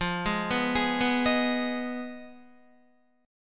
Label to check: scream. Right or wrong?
Wrong